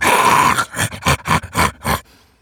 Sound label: pain; slender; zombiebm